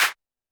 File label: Roland TD-20 Percussion